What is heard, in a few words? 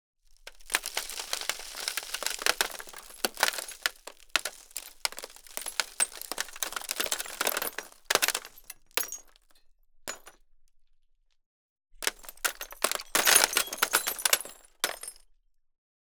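Trickle, Glass, Metal, Debris; Shards; Double Trouble Audio; LD Mono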